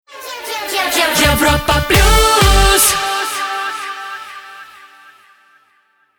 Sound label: Jingle Rapid Fire; Europa Plus